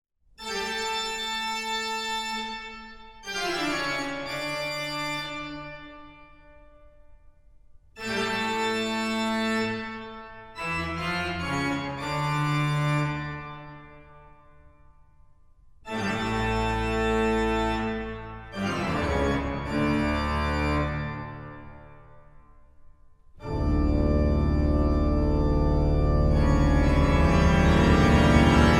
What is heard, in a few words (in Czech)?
Toccata A Fuga D Moll Bwv 565